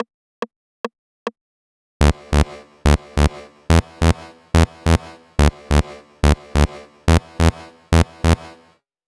Bass Bridge, 142 BPM; Vengeance Samples; Hello You

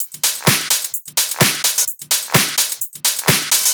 Houseloops 128BPM; Vengeance Samples

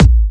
Kick 138BPM; Drums & Perc; Vengeance Samples; Nightfly Kit; D minor

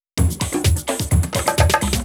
Fill 128 BPM; Vengeance Samples; VEH1 Loops

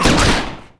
Impact; Vengeance essential club sounds-3

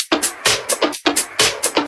Electro Loop 128BPM; Vengeance Samples

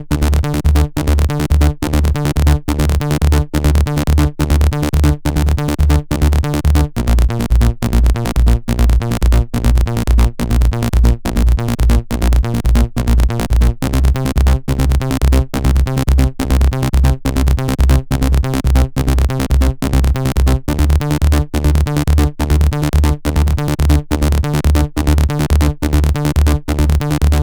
Bassline DRY 140BPM, D minor; Vengeance Samples

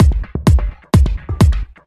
Housebeats, 128BPM; Vengeance Samples; VEH2 Loops

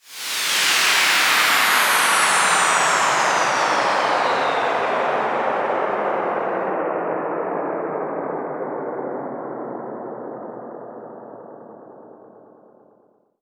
FX Sweep Down; vengeance essential club sounds-3

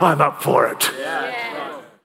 Vocal Phrases; Vengeance Samples; Vocals

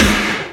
Snares; Vengeance Samples; VEH2

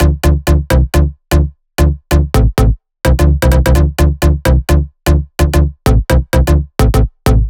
128BPM Melody; Vengeance Samples; Mars Kit

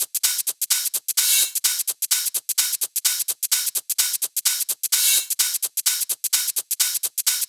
128BPM Kit; Vengeance Samples; Wasa Kit

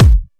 Kicks; Vengeance Samples

